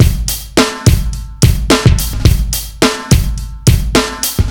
Funky 105, hammond riff

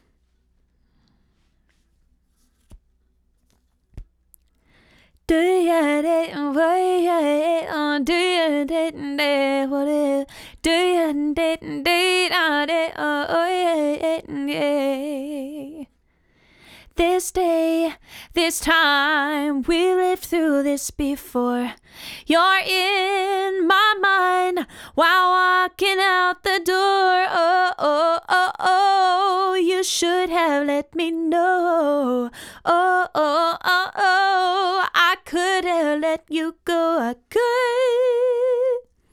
Vox

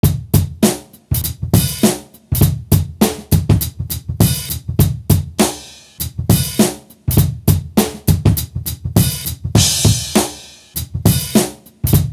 Audio Track, Hammond

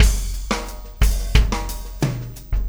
Basic Beat

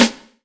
BIG SNR

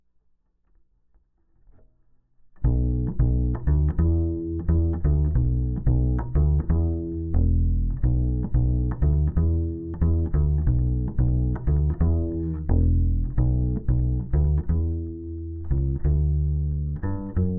Bass DI

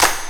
VEE Clap

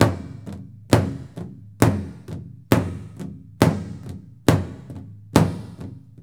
Big Drum in Gym

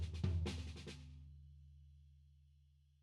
Kick

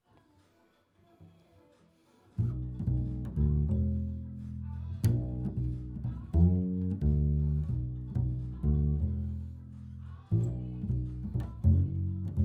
Bass Mic

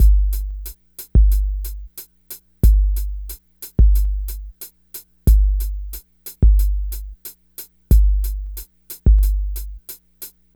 drm 91, Hammond

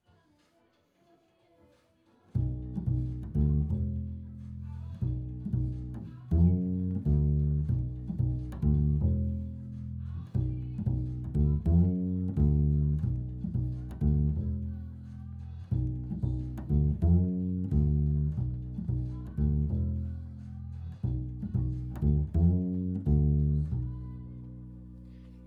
Bass Mic

